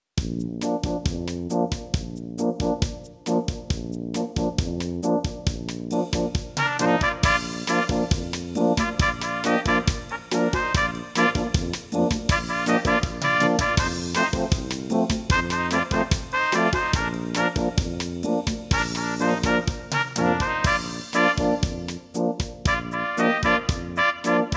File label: Brass Fast tempo changing tempo